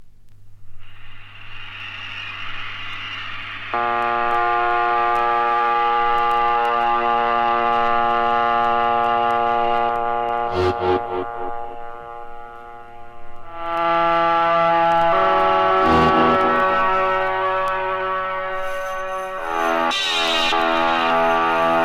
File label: ELECTRONIC SOUND